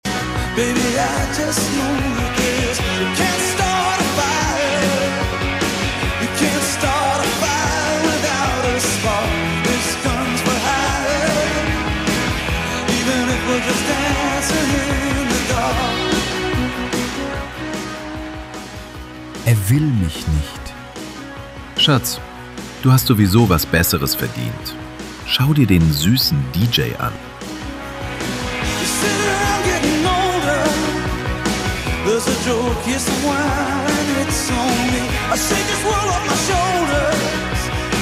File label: Disco